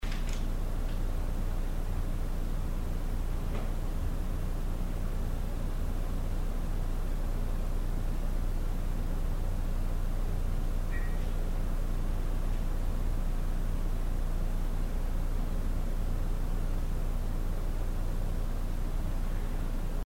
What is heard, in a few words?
Theatre Balcony, MUSIC PIANO